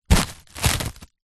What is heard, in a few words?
household cling film wrap sheet touch pull hand away